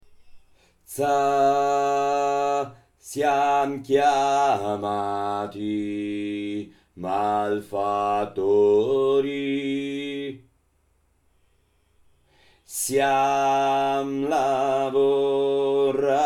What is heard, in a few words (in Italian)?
noi vogliamo luguaglianza seconda